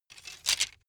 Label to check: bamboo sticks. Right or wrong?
Right